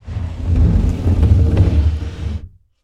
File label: Scrape Slide Wood; Sliding Bookcase; HauntedBloodlines; SFX Producer